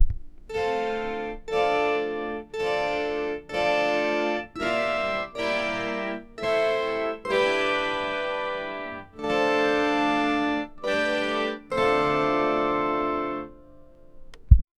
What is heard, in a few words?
ussr anthem on celtic har